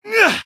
medic taunt flip exert; video games; source engine; taunts

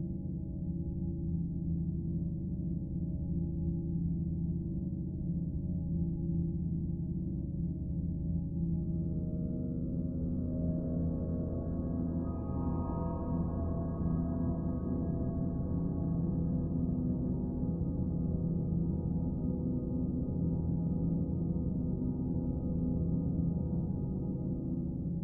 editor drone; Source Engine; Portal 2

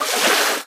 water wade, footsteps

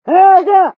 pyro taunt rps exert; tf

